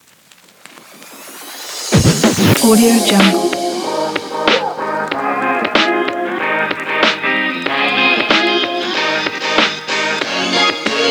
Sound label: funky hiphop chill